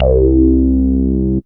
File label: SWEEP C1; Best Service Alpha Dance vol.2